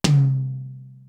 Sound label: ster tom; PROC.TOMS; East West; Global Sounds